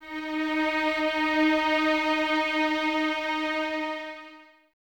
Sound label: String Violins; String Ensembles; Miroslav Vitous; 11 Violins